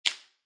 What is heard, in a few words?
Pill Bottle Cap Large Place Down, Foley, Pill Bottle Cap, Plastic, Large, Placed On Counter Top; Set Down